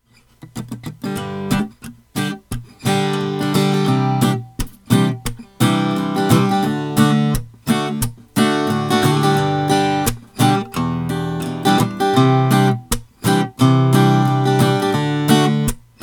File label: recording king rp1 327 solid adirondack, guitar; solid adirondack top